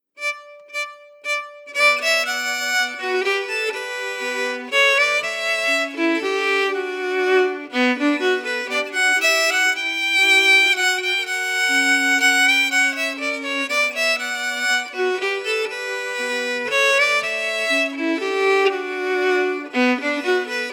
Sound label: Tourner a Trois melody